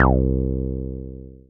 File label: Synths BASS